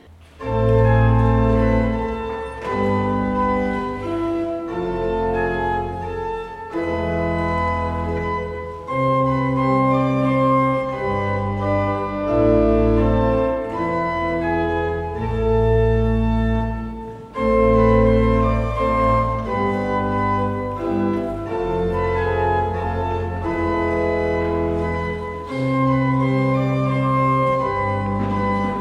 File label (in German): Chaconne a-Moll - mit Pedal, orgel; Henry Purcell